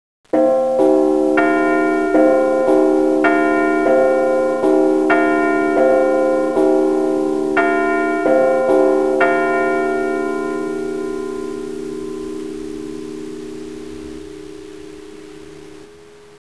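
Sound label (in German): glocken geläut clausthal reihenfolge, korrigierte Tonhöhe, höchste Glocke - 1/2 Ton; Marienkirche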